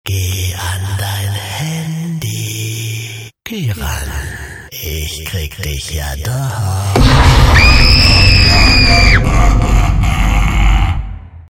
klingelton horror; halloween

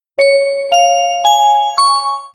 flughafen ansage airport gong sound

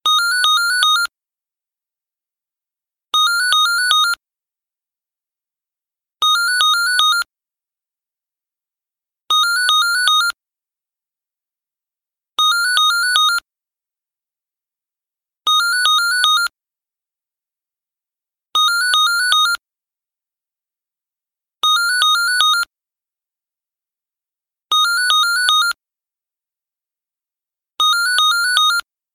klingelton arztpraxis gigaset; gigaset 01

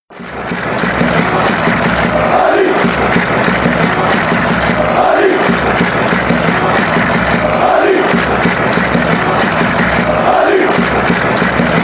klingelton torhymne; real madrid; rmcf; real madrid fangesaeng

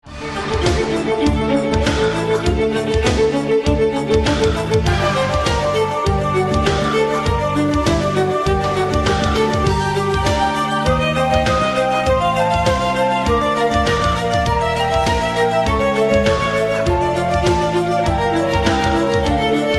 violine klassik

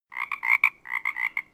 klingelton frosch quaken; all4phones

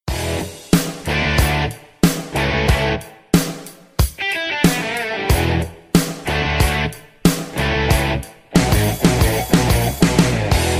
klingeltoene instrumental

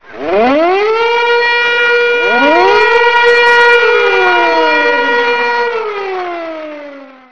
fliegeralarm sirene; spasssounds

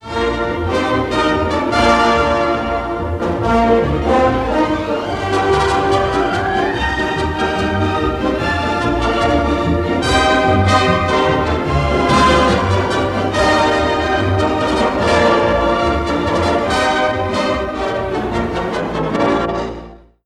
klingelton intro gong; tagesschau; intro 1952

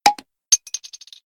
opener klingelton flasche oeffnen sound effekt

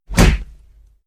punch schlagen sound

